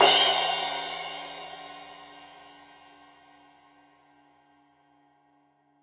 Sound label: Crash 15in L edge, Cymbals Stereo